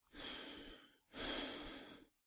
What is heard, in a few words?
Breathe - Half breathless; Eden; RadwinIsland